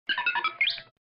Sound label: R2-D2